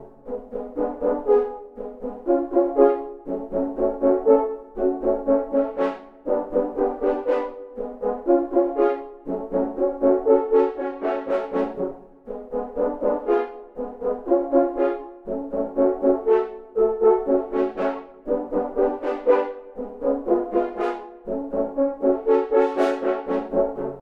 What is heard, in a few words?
horn